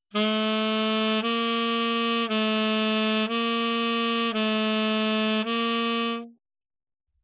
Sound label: tenor, saxophone